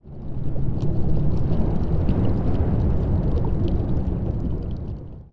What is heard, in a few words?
water env